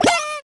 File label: cake tap